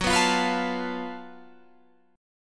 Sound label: F sharp Minor Major 7th Double Flat 5th